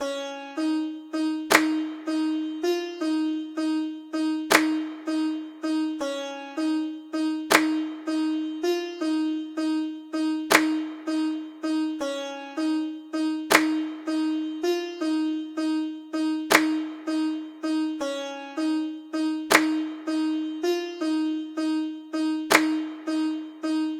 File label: Pea Dance BGM Base